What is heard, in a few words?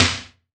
R-100 snare; EdgeSounds; Drum Mashines; R-100 DRUMS